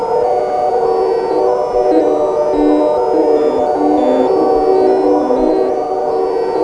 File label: voices, weird atmos; Zero-G Ambient 1